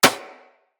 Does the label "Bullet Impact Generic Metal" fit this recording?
Yes